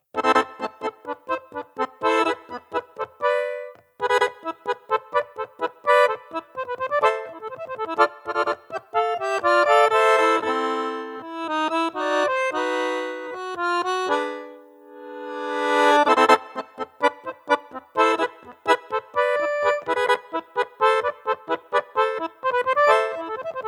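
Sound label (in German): TA3000eco Tango, Akkordeon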